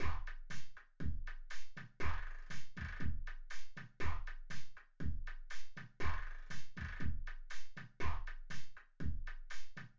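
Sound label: with drums synthetic convolutive 1m; SASSEC07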